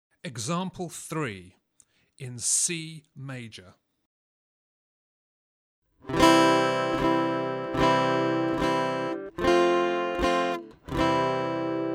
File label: Aural Harmony Test, acoustic guitar; Grade 4